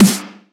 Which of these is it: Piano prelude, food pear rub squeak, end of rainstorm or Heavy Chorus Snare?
Heavy Chorus Snare